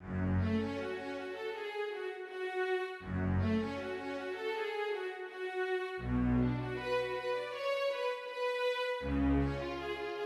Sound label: Riff; Scott Storch Masterclass